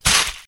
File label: Water Splash